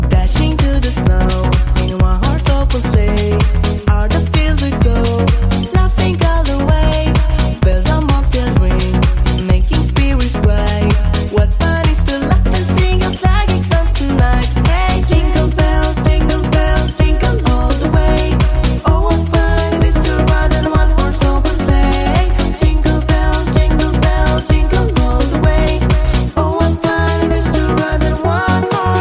Jingle Bells

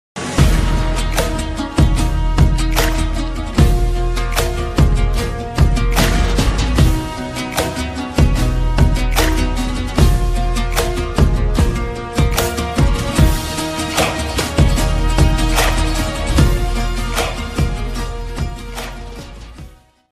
Arabic trap

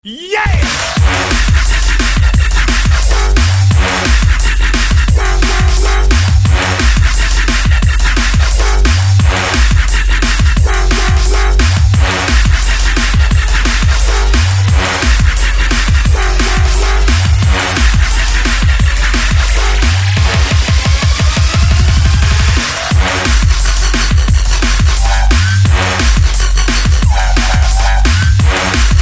Dubstep Crunk

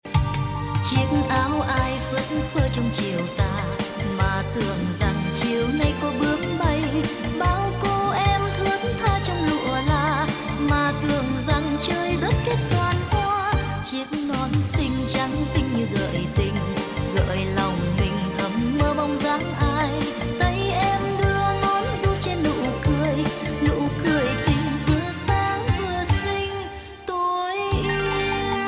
Vietnam

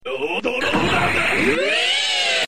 WRYYYY

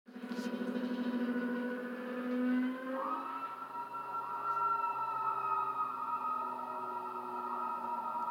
Wolfs call sonar

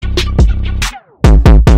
doomed tone

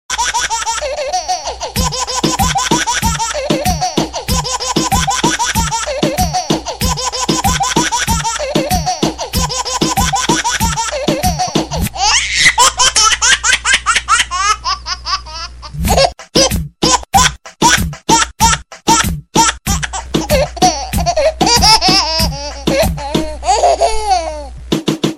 Baby Rock Song

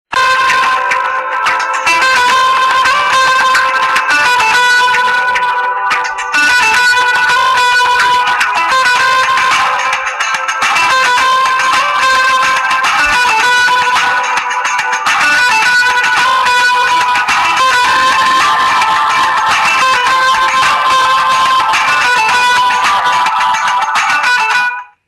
Karbonn Default Ring

